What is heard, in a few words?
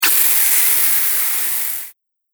Electric Strike Sound, impact; Miscellaneous Impact Sound Effects; Andrew Fleming